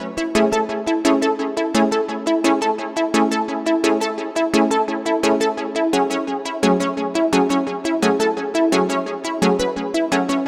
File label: A Synth Chords, Synthwave; Synthwave Loops; Flamingo; Futurism